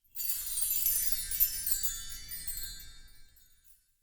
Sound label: Hut Interior Chimes; Ambience; Midrath Island; Grandfather